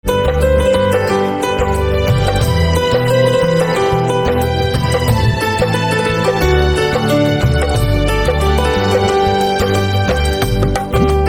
Piano Instrumental Ringtone

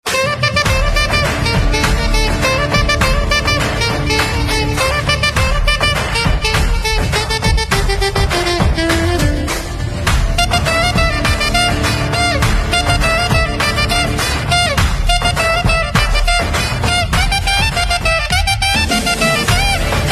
Saxophone Instrumental Ringtone

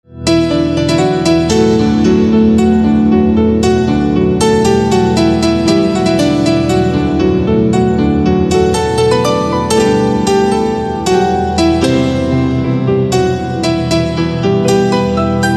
Piano Instrumental Ringtone

